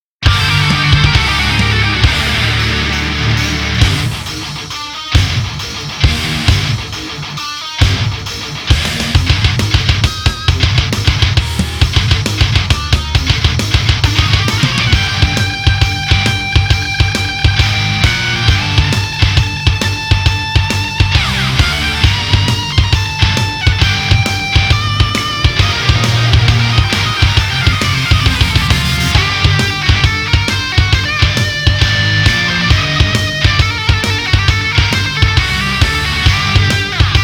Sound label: Christmas Death Metal